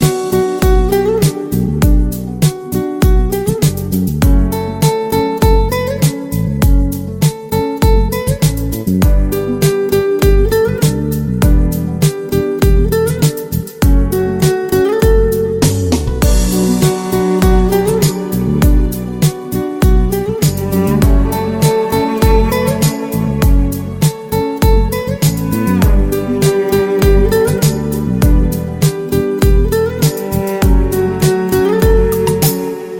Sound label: Light Ringtone; ملایم آرام دلنشین